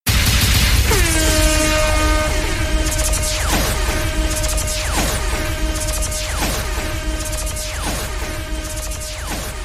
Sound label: funk alien explosion